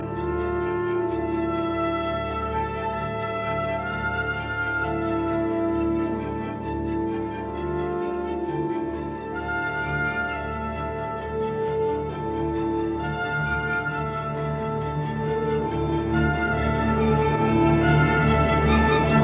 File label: Symphony in D minor, Massig bewegt; Symphony No 3; WAB version ed T Raettig